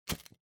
Lighter Flick

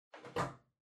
TV Hardware Far RCA Unplug

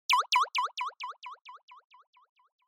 Anime Motion, High Delay

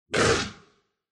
Monster

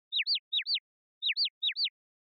Motion Dizzy Slow Short

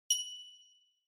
Finger Cymbals Mute

